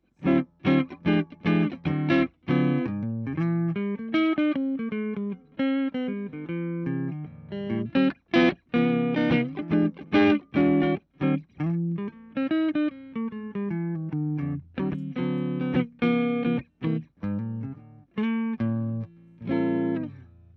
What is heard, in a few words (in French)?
les paul clair; egnater